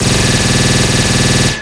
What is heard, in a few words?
bullet rain; serpentine